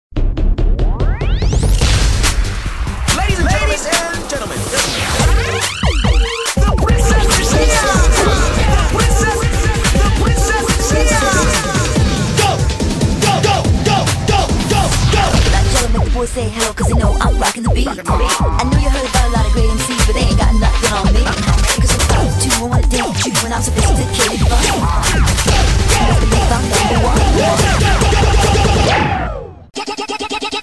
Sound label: Cheer Mix